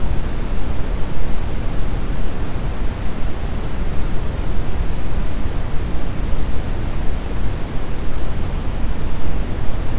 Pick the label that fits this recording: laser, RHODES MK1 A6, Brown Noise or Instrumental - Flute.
Brown Noise